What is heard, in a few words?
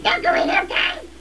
ocarina